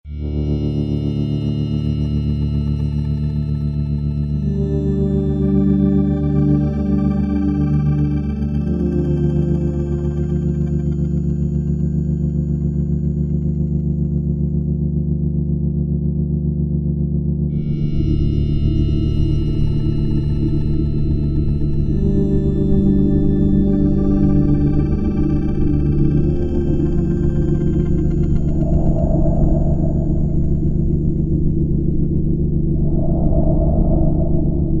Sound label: Sci-fi Horror